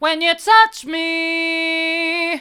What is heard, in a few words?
when you touch me hard, vocal